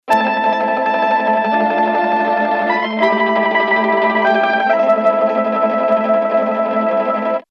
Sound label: Mellotron M4000D MK1 Mandolin